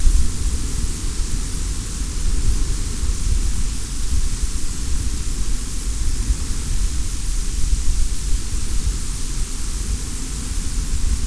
wind

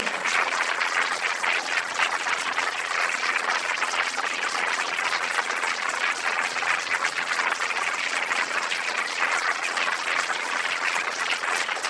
applause

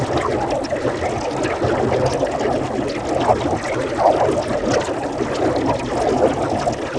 water

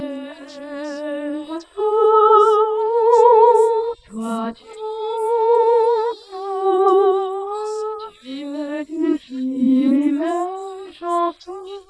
singing

